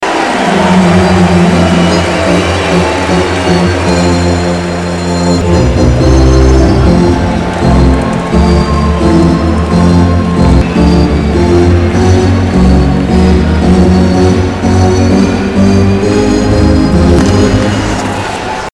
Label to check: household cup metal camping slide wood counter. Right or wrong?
Wrong